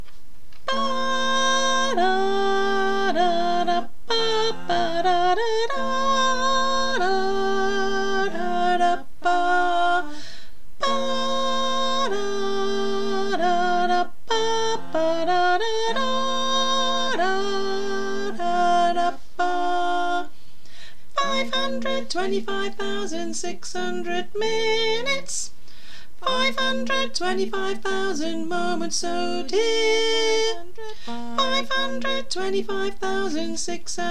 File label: Soprano; church